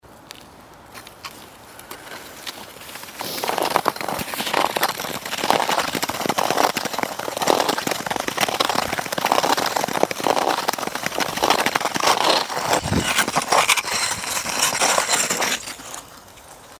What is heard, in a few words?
pumping Sounds Skates